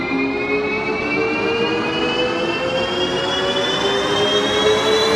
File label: Bonus Wheel Symbol Anticipation; JackpotDarts; ClubFusion